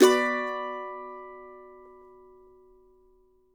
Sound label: mando min; Sampleheads; Whole Lotta Country 1 & 2